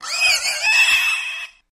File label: Pig Screech Painful